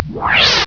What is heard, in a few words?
Drone; RoboWar 3.1